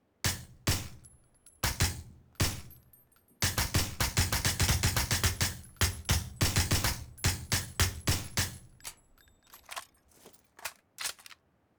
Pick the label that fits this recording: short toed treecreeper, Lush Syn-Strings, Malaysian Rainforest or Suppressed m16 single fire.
Suppressed m16 single fire